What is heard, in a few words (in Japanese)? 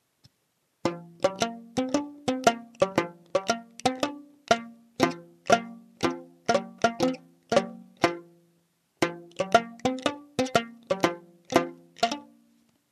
wagomu karinba